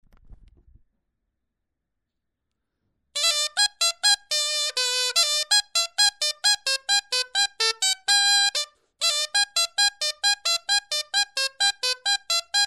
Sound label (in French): clarin etude